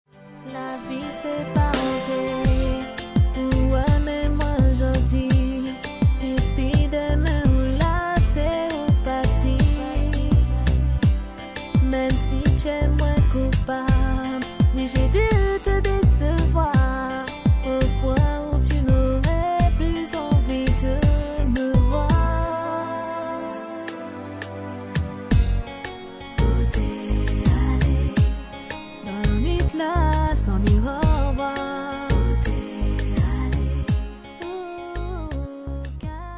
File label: zouk groove